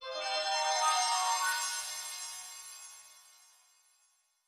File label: Gem Stinger